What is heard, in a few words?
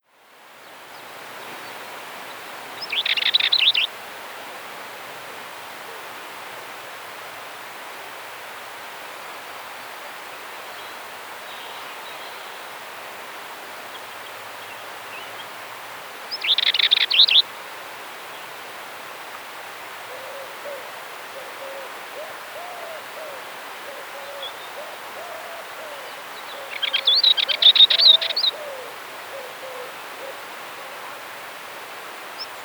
Dartford Warbler, birdsong